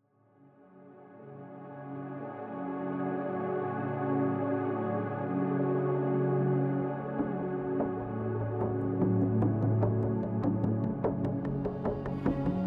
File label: Modular + Organic Perc